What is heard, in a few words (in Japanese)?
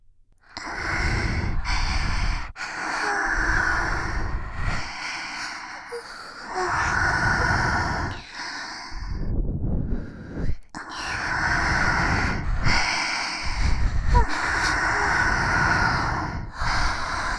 吐息ふーふー; 永遠絶頂ロリータハーレム; ボトムズ